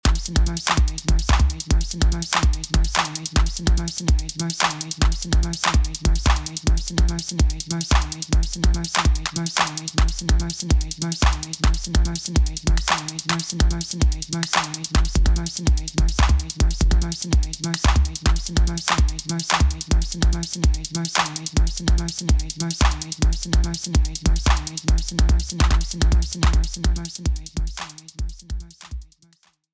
Random Kick Clap

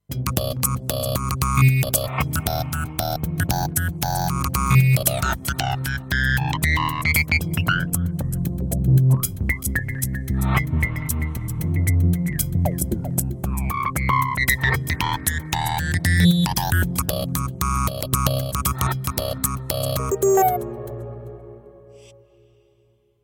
Freaky FM

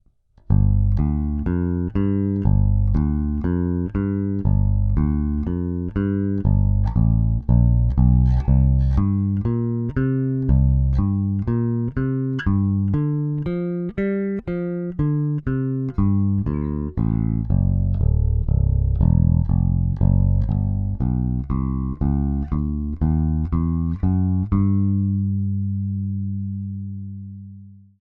AER AMP One Two Three Colour on

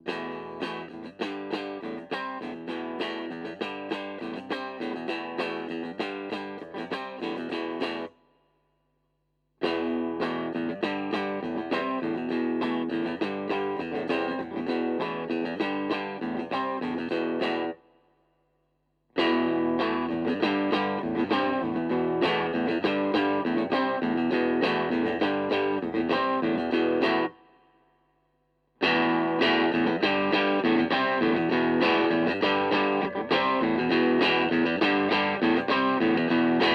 Harley Benton Volume; Maybach Vergleich

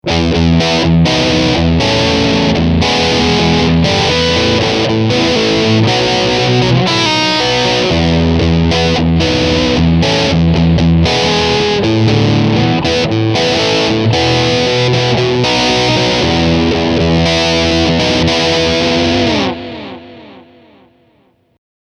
Preset Bank Metal

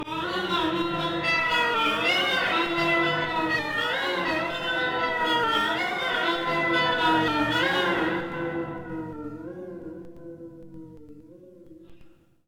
Guitar event horizon contortion; Erica Synths Black Hole DSP2

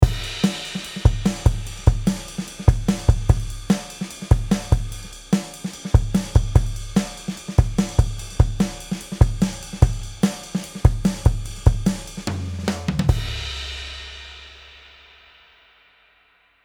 Drumbus peak 1 db; Cameleon Labs 7721

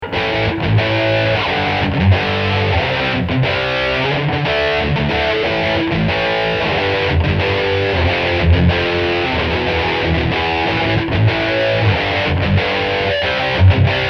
power riff

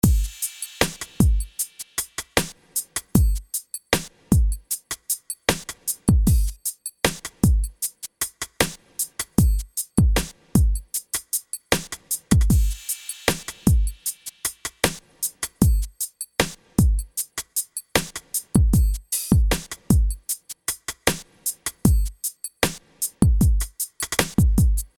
Drums Compressed Heavy

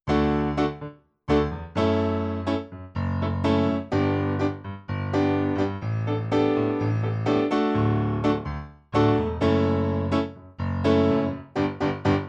ES 100 Studio Grand

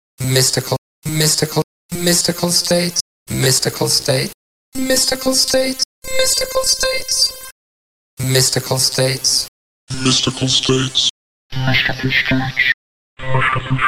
granular formant

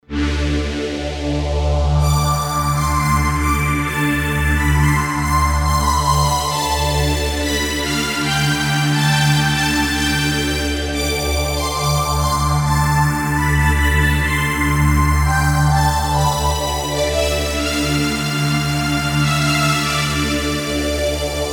Padsound

